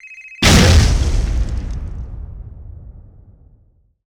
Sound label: IED Explode; zps; Weapons; Explosives